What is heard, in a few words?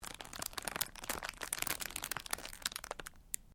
Cellophane crinkle; Material components for constructing more complex sounds; Plastic; Materials